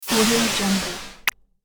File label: robot core user sound effect